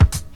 shiny steel kick drum sample E key

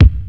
natural kick drum c key; Duration 0:01; Mono

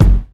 good bass drum f sharp key

